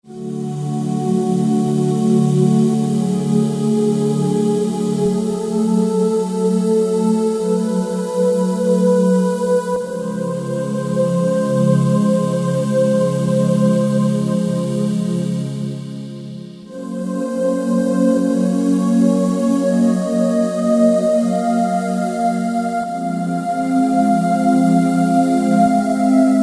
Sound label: Roland JD-990, Factory Patches examples